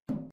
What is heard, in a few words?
Metal Box Impact